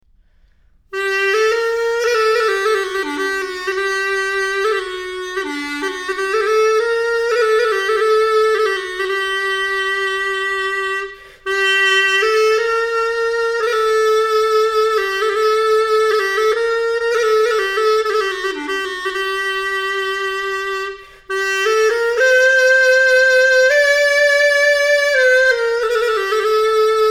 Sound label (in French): chalumeau Improvisation